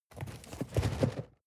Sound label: cardboard box medium shake soft short; objekte